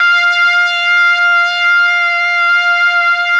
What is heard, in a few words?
Mbrasf5, MelloBrass; Oldies